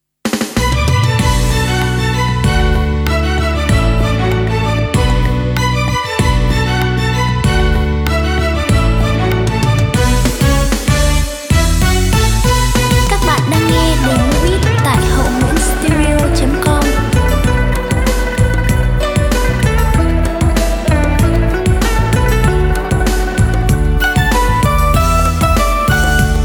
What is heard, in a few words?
beat, playback